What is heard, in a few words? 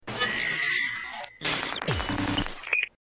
logoff; wd ctos